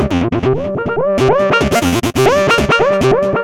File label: philter (acidizer); Sonic Foundry (Sony Creative Software); Ugly Remnants VOL-2